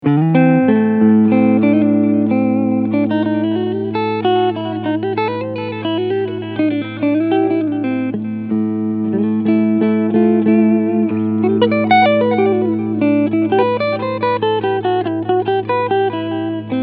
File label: Clean Position